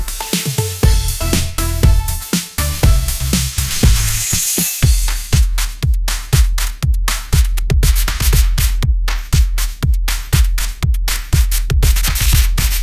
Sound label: sunshine road pop groove, ringtones; ai-generated